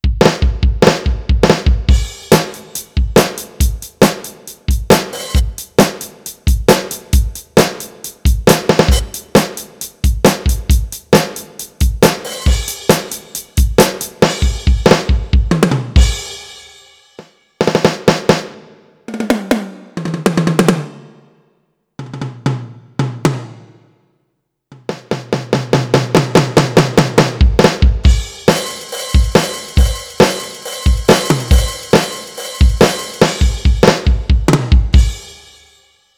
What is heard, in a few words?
Alesis Nitro Mesh Rock Kit; millenium mps 850